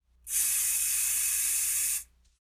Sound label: Spray